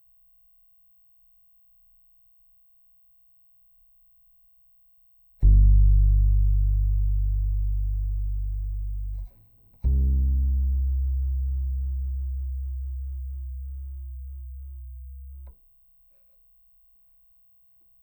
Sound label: C Bass DI